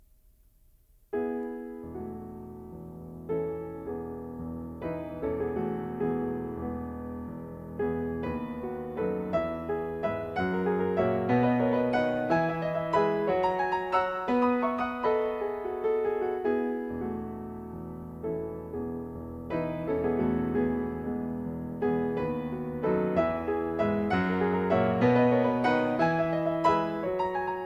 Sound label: waltz; Brahms